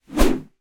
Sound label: Swing Large, Melee Weapons; David Dumais Audio; Melee Weapons Sound Effects Pack 1